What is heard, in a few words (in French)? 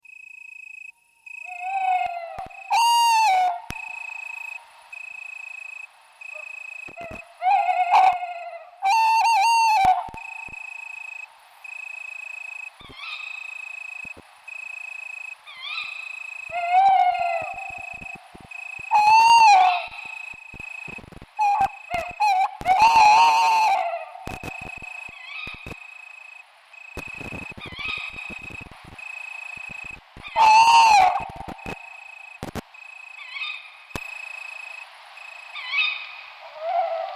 chouette hulotte strix aluco